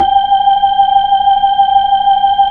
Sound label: B-3, Hollow; Keyboards of The 60's and 70's